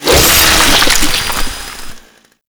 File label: Saw Impact Flesh, Blade Impacts; Warframe; Grineer